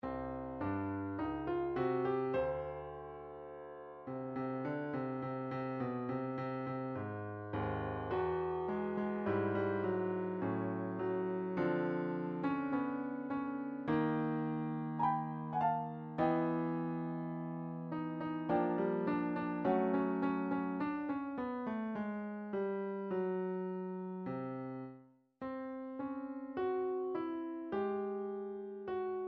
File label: ten short piano pieces, midi; shostakovich